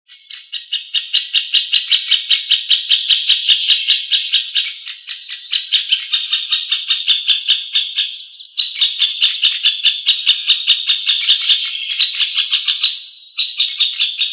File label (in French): Cris du Pic mar, Cris rapprochés et réguliers, pendant l'élevage des jeunes; ATLAS NICHEURS BRETONS 2004-2008